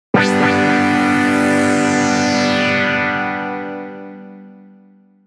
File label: Dream Searching Pad, jup8